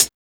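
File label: hi hat; E-Lab; X Static Goldmine 1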